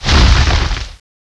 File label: tree crash, jungle; gearbox